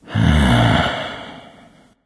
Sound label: thinking; smite; vamana